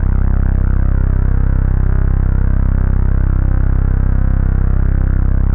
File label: Dance Floor Bass, Multisample Basses